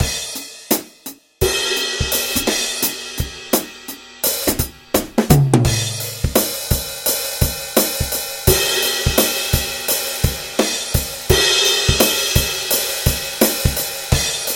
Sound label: drum mix